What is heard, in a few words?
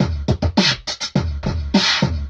Beatbox, Drum loops